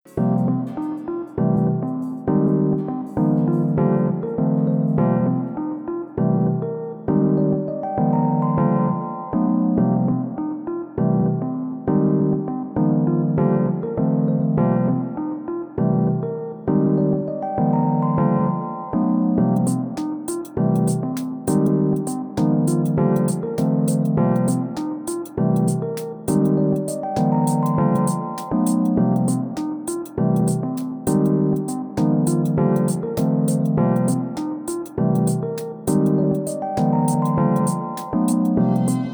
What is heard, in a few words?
chillout, 432 hz; w rytmie miasta